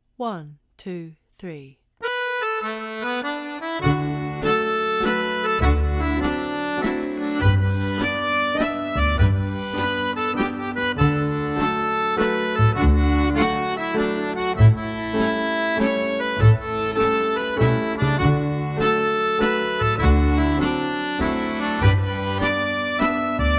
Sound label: Bluegrass Waltz